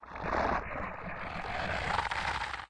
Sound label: griffin idle, monster